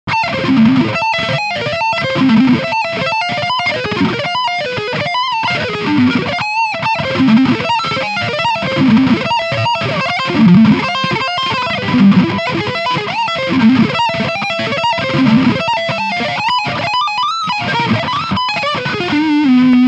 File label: Leadline Scoop Maximum